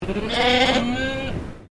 Animal Sheep Shropshire Young Baa, Sheep at Collingwood Children's Farm